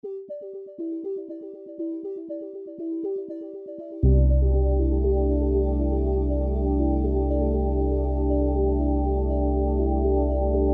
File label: Background Melody